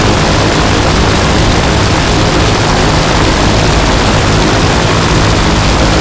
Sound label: bearcat side, engines; War Thunder